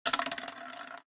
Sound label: ball in; European Roulette; Evoplay